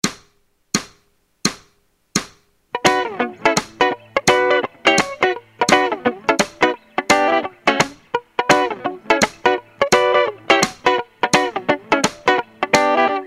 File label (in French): Afrobeats, Guitare; intermediaire; Les plans de gratte